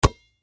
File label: Sports Basketball Catch